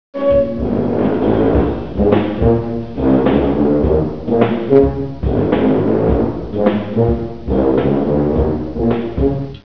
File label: tuba sound